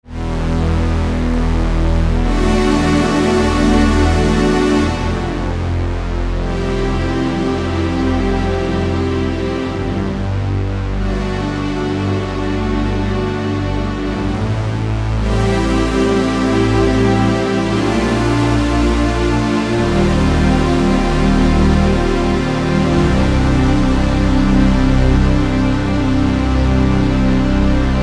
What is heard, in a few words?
Roland JV; roland-cartessons